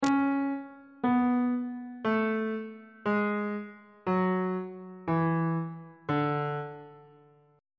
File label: d lydian mode descending on bass clef